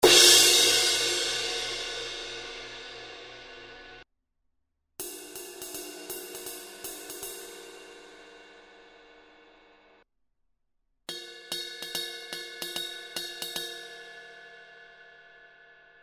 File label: 20 inch contact crash ride crash ride bell; dream